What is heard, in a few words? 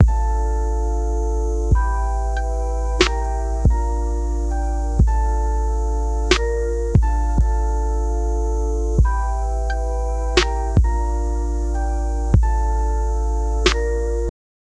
guitar part; 808